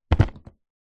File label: musical violin in case set down on carpet; zapsplat